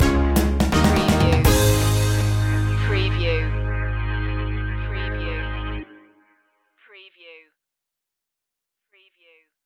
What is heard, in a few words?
a new day has begun stinger